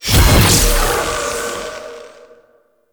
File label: Noctua Alt Shot Fire